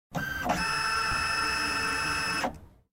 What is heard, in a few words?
Scaning; Game rip; MiSide